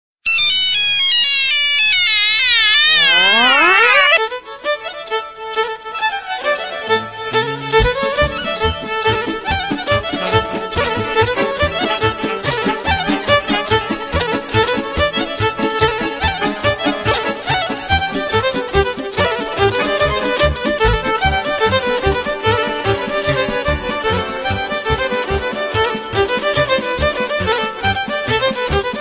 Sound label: nokia tune rmx